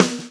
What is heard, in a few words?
Snare